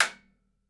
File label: SURDO; E-MU Producer Series Vol. 7 – Old World Instruments; World Combos